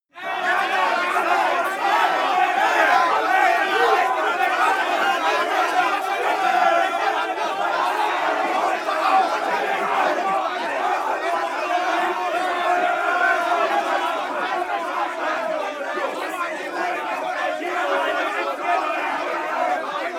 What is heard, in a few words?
scream turmoil